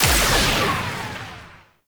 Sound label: Charge Blast; Warframe; Corpus; Corpus Ship Remaster; Dera Moa; Ability